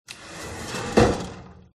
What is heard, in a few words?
open drawer oven; fox audio